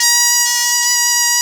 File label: BOMB2 B3, BOMBARDE2, Celtic Flavours; USB Soundscan vol.42; AKAI